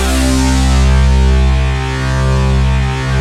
SYNC, PROPHET; Rubber Chicken Software Sample Bank; SCI PROPHET

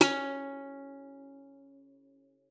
C#4, guitar; Xsample VOL-2

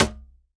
WORLD DRUMS MIXED DRUMS

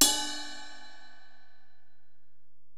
HiHat, Alesis DM-PRO

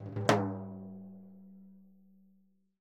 TIMBLO SHO, Synclavier Percussion; ILIO; Synclavier Percussion 2; World & Orchestral

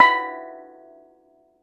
STEEL DR; E-MU Producer Series Vol. 7 – Old World Instruments

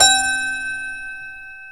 C 3 TOY piano; AKAI S6000 CD-ROM - Volume 1

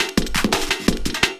LOOP, 170 BPM, Drum & Bass

